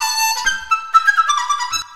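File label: Chinese FLUTE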